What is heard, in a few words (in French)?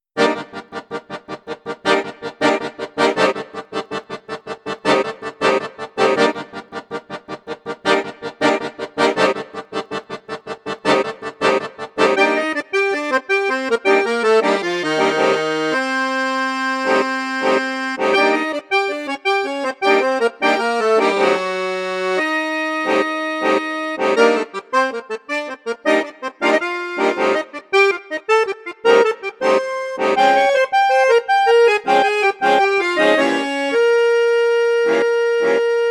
registre basson flute juste flute haute piccolo, hohner vivaldi accordeon